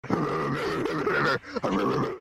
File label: dingo bark